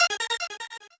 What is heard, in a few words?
normal hit normal